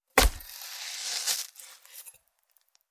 zvuki pererezaniia gorla

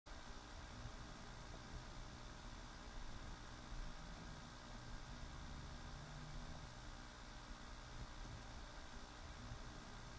zvuki elektricheskoi girliandy

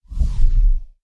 zvuki s effektom peremeshcheniia videokamery

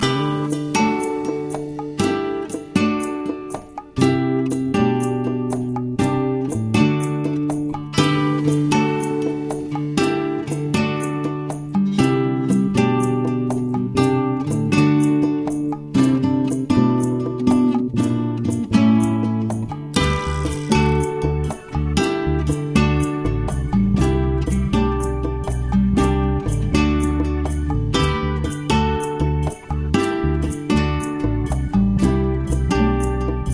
zatsiklennye melodii dlia gitary loop

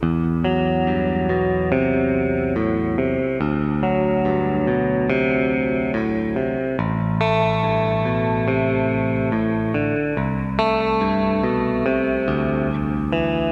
zatsiklennye melodii dlia gitary loop

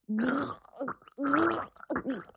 zvuki pererezaniia gorla

